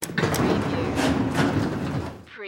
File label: Service Elevator Door; sound effects bible